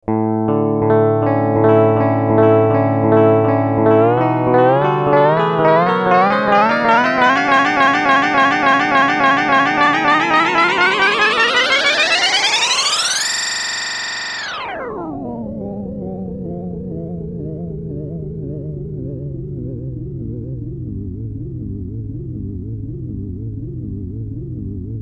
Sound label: crazy feedback, super delay; normal delay with crazy feedback; empress effects